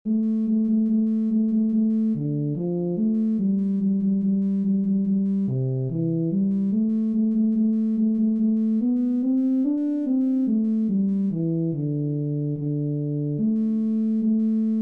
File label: Drunken sailor Euphonium